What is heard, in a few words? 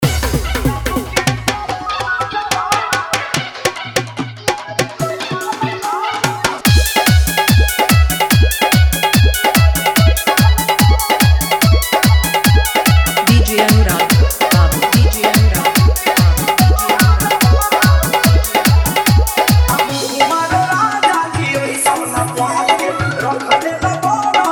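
Full Dholki Bass Dance Mix, Bhojpuri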